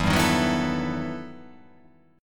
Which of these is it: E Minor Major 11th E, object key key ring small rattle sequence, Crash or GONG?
E Minor Major 11th E